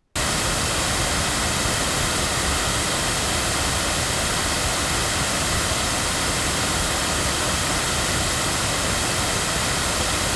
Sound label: loudspeakers; Lauten Oceanus; microphones